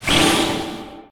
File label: Gimmick Appear; Disney Star Smash; Android, iOS, Mobile